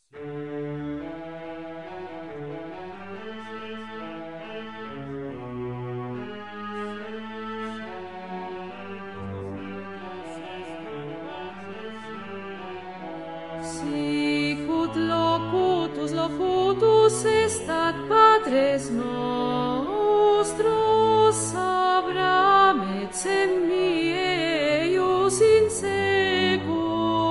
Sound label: Sicut locutus est Alto